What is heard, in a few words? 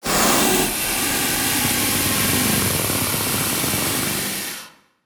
hiss, alien voice